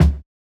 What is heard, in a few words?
Kick, jungle breaks drum sounds